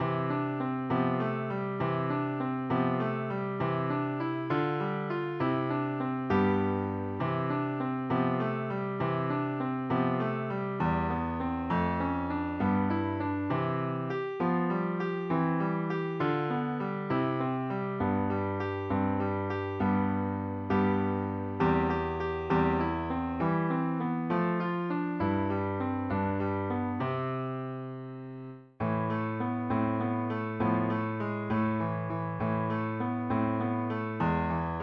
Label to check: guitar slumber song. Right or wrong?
Right